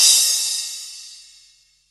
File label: Long Tail Reverb Crash One Shot D# Key, Royality free drum crash sample